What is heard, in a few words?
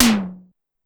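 Simmons Down, Drum Machines Clavia DDrum-AT Pitch Envelope; 300 Drum Machines; Pitch Env Various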